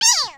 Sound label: miau death; Flapcat Steampunk